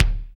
BD, BD Dance; Sound & Vision; Gigapack I CD 1

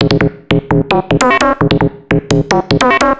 Atonaloop, Industrial Analog

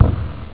tong; Amiga Soundtracker sample

